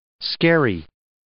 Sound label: scary